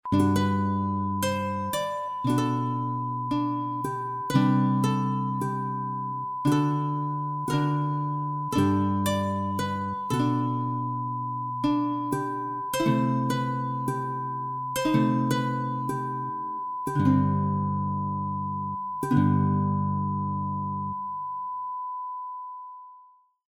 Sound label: air groove